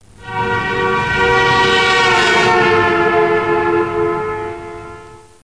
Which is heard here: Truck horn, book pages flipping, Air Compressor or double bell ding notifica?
Truck horn